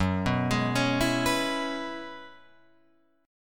F sharp 7th Suspended 2nd Suspended 4th, Guitar, Open F; 6,6,8,8,7,6; arpeggiated